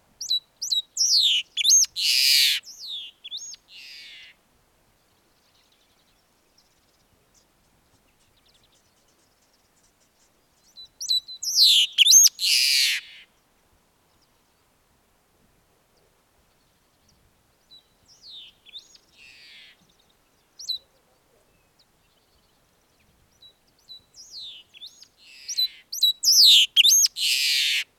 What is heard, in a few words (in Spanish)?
loica, aves; lampa